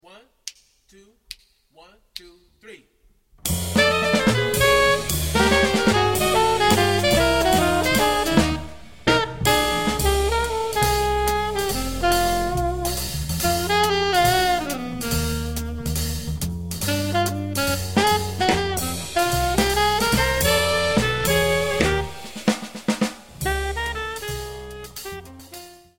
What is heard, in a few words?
minus piano